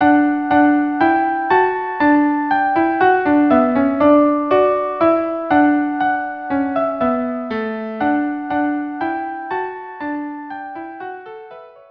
ode to joy violin duo

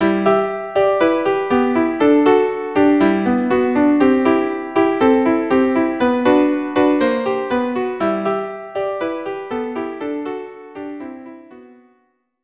violin duo; Al Hanisim

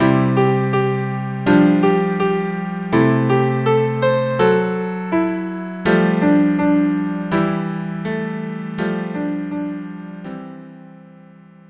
viola duo

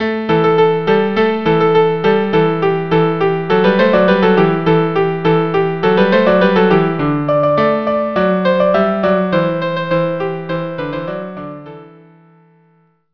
Debka viola cello

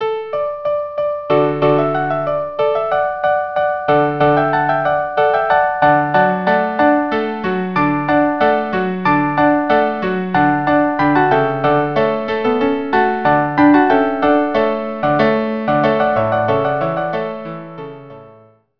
quartet

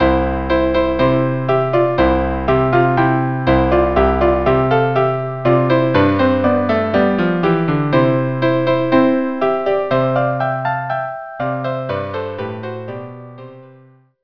trio

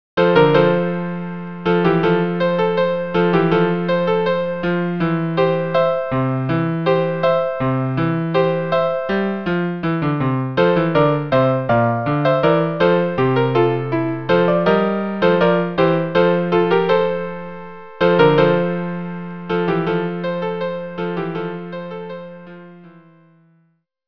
trio; fyre fyre

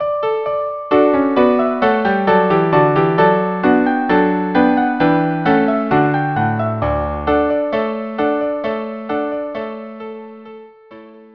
string quartet; Telemann Heldenmusik La Vaillance

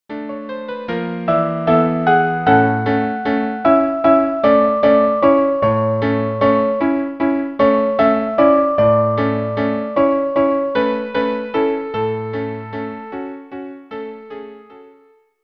string quartet; hymn to the sun; le coq d'or

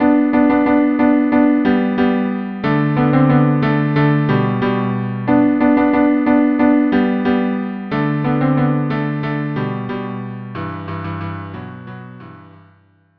cello duo; Rossini; 5 duos

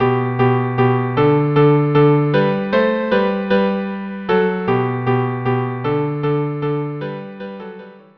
viola cello duo; Rossini; 5 duos no 5

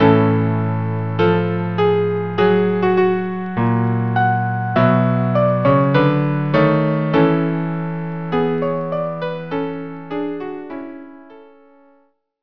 trio

